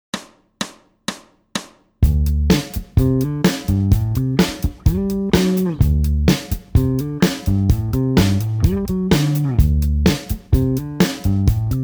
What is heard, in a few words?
D Mixo Licks 127bpm